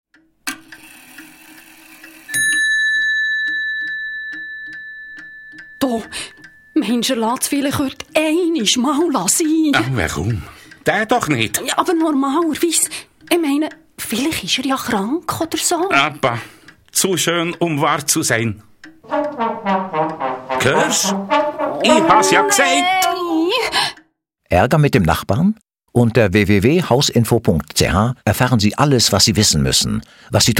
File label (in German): Posaune